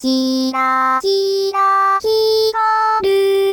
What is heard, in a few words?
synth test